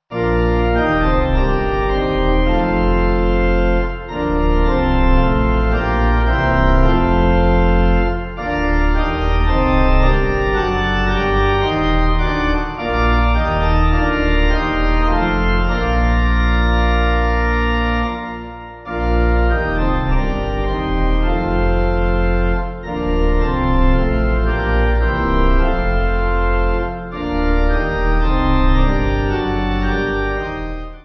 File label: Pipe